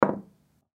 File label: Pool Drop Ball, Pool Drop Ball On Felt Short Roll; SPORTS; SoundEffects-Blastwave